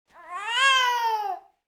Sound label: baby cooing